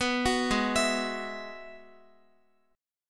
Ab Minor 7th Sharp 5th Ab, Guitar; arpeggiated; x,x,9,11,8,11; down; 4E,4A,5D,5G,5C,6F

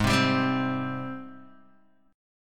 Ab Major 7th Ab down Guitar Open D

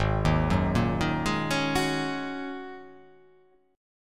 G sharp 9th Suspended 4th G sharp

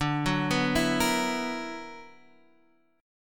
B-Minor Major 11th

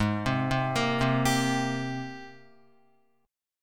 Ab Major 9th Ab, Guitar; AbM9 arpeggiated; JGuitar

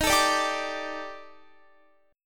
Eb Major 7th Flat 5th Eb Guitar 4E 4A 5D 5G 5C 6F; EbM7b5; strummed

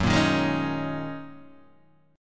Gb 6th Add 9th, Guitar, DADGAD

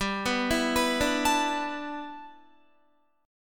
G 6th Add 9th G Guitar, chord; G6add9 arpeggiated; 15,14,14,16,14,16

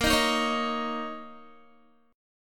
A sharp Minor Double Flat 5th, down, Guitar; A#mbb5 strummed

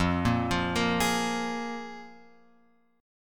F Minor Double Flat 5th, guitar chord; Fmbb5; {1 1 3 3 X 4} arpeggiated

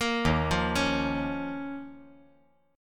D sharp 7th Suspended 2nd; arpeggiated; JGuitar

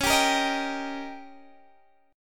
Eb 7th

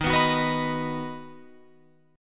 Eb Minor Sharp 5th Guitar Open F; Ebm#5 strummed; JGuitar

